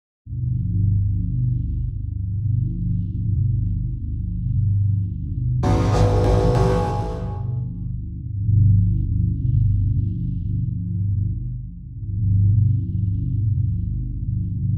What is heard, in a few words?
Engine Riot No Drums; Ninja Tracks